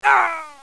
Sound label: die; Caesar III